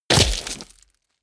body fall gravel heavy; krieg-waffen; nahkampf; soundpool

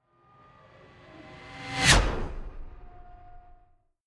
fly by hover car hum high; swooshes-swishes

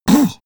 sniff back; koerpergeraeusche; menschen; soundpool

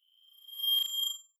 sci-fi beep fade in high; whooshes rises; risers

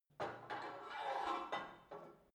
put weight onto barbell, fitnessstudio

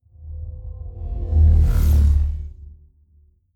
sci-fi vehicle train drive by hum; raumschiff

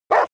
border collie bark dry; natur-tiere; hunde

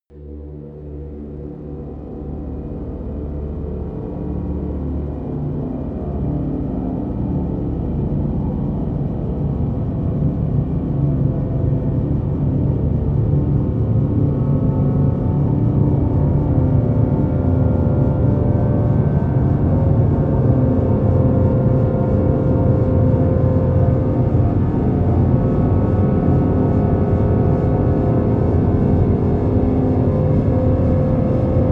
drone dark passion

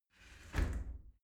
shower slide door close hit soft

